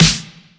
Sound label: hard snare